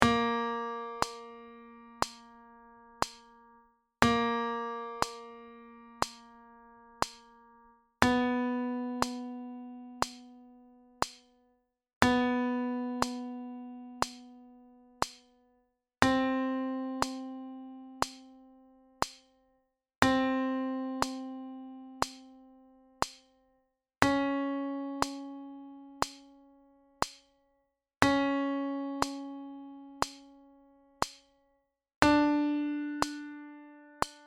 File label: Lip Buzzing Exercise